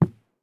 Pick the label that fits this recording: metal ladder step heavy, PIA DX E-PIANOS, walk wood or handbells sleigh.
walk wood